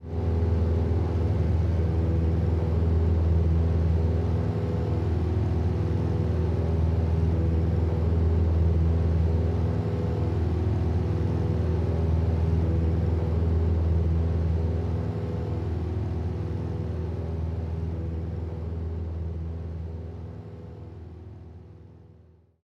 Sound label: Plane Flight; Animal Crossing New Horizons